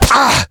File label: bullet hit pain